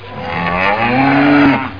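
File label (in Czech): KRAVA, cow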